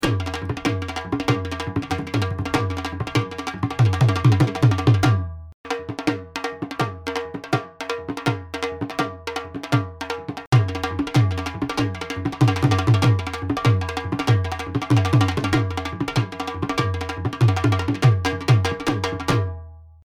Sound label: dhol, Professional Loops of Punjabi Dhol from Punjab Dhol Leather